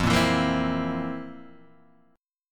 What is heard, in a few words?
F Major 7th Suspended 4th F; FM7sus4 Chord {1 1 2 3 1 0} strummed